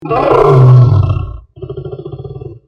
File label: lion